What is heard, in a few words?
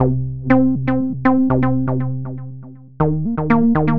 Pro Bass, Bass Foundations; Sonic Foundry (Sony Creative Software); The Electronic Music Manuscript Collection